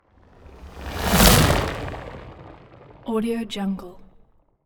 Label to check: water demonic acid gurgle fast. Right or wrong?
Right